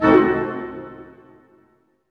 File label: HIT, Dynamic Orch, Tutti Hits; Roland L-CD702